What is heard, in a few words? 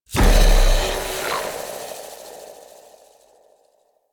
Wave Burst; Warframe; Warframes; Pacifist